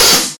muffled open high hat sound d key, open high-hat sound tuned to the D note